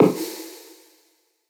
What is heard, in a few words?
Perc Plopper